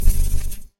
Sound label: crop corn